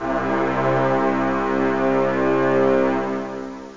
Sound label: orch brass; OctaMED SoundStudio V1; mirage